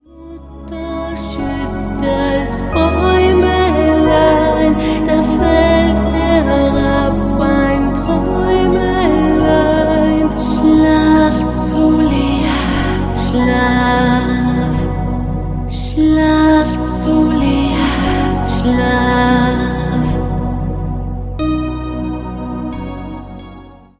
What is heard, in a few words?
SOLEA